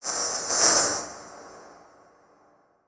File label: Title Transition Electrical; Adobe; Production Element